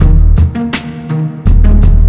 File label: site loop